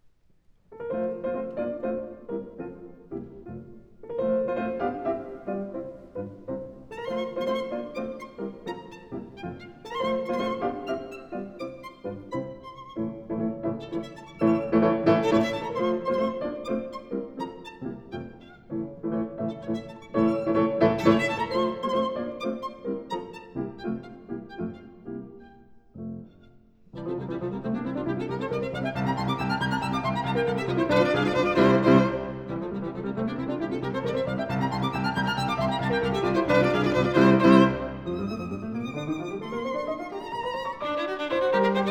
Sonata for violin & piano No. 5 in F major, Scherzo. Allegro molto; Beethoven; ＂Spring＂